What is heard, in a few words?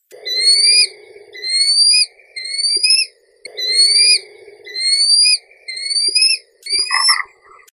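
Amur falcon, Falco amurensis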